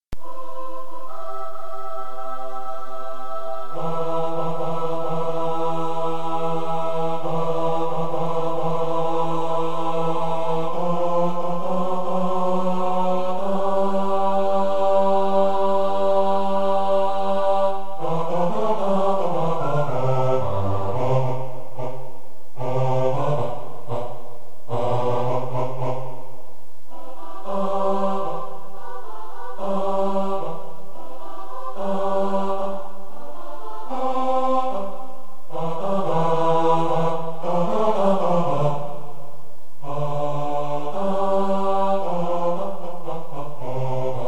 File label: Bass